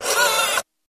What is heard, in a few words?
Door Outer Open, Damper, Wavering; dat machine